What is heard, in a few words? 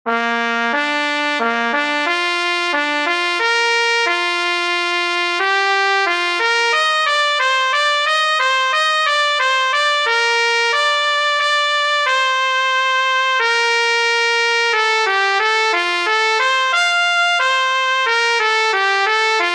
march trumpet; bach carl philipp emanuel